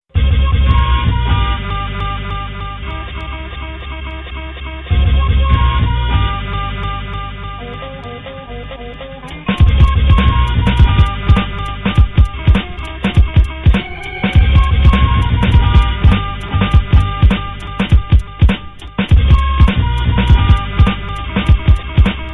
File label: beat